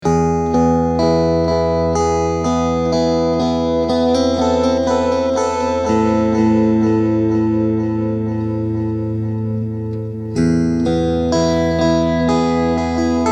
Ping Pong Delay

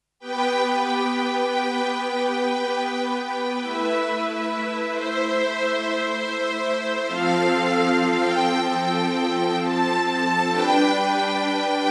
Strings Full; V3 Sound Sonority XXL

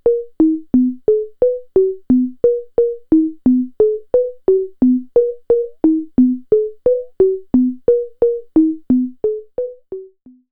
Behringer Space FX B2500 Filterping Flanger

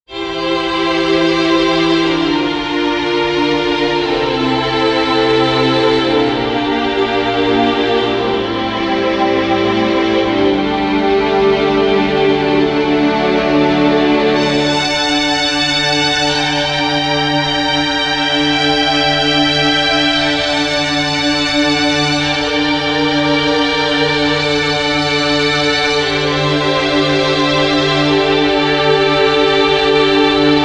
Nord Grand Mellotron Strings Customized

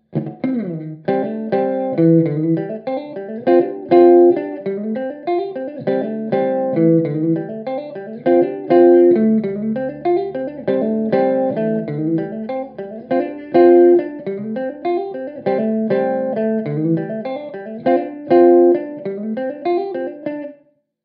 compr off

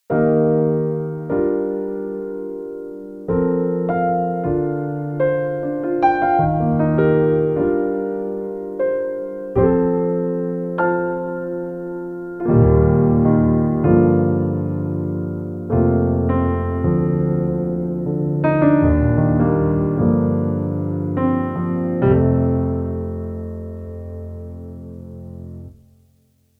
Grand; Clavia Nord Grand Royal Grand

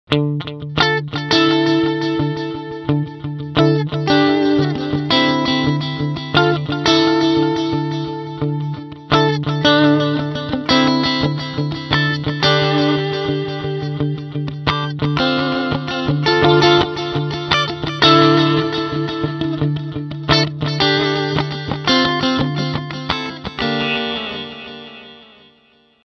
Ping Pong delayline

